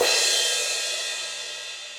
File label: Crash 18 Warm And Low, Cymbal; Drums; Drum Hits; Standard Hits; Ableton; Factory Packs; Session Drums Club